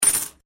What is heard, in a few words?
Coin Penny Multiple Drop Wood; Common coins; Money; Objects